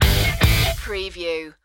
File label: pedal to the metal stinger, Extreme Sports